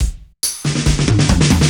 Fills, Loops 140 BPM

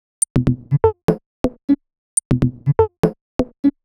Sparse Top 123bpm, House Drumloops; Zero-G House Fabrik Drumloops

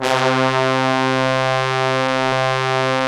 BRASS, Brass Bones, Brs Layer; Club-50 - Foundations Roland

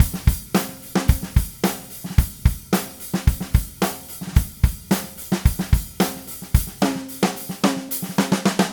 Red Maple Groove 110, DW Red Maple Kit

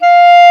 clarinet F 5; East West; Global Sounds

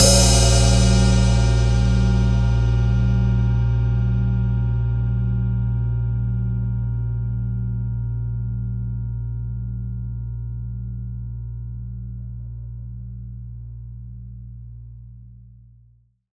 Cymbal Hit, Impact Drum Hits

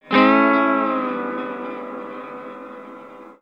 Blues Hotel, Guitars; Zero-G; Total Drum Bass

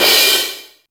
Oberheim DX Crash

